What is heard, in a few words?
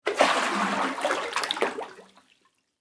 Water Pour Into Tub; Pouring; Sound Effects:Objects; BlastWave